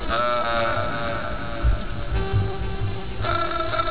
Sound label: loop